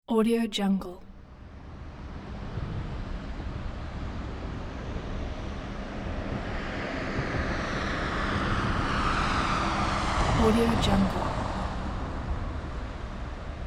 night street ambience; worldwidesounds

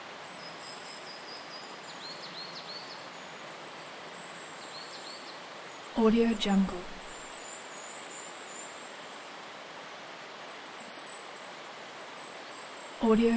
ambiance woodland bird songs morning slovenia; blazznet

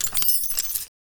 ui unlocks sell junk parts; esmteam

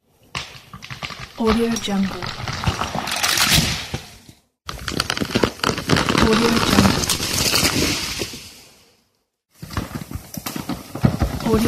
falling tree; archisound

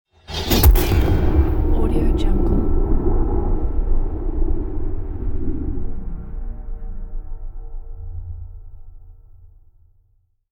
trailer impact

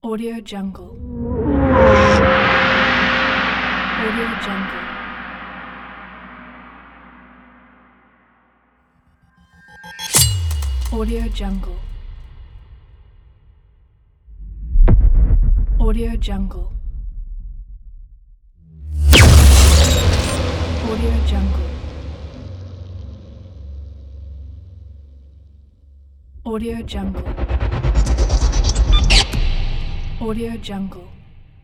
epic impact

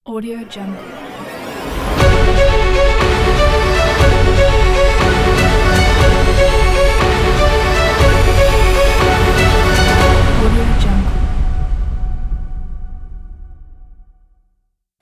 epic logo

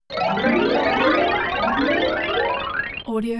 xylophone up and down

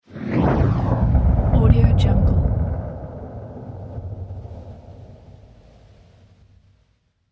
distant low jet whoosh

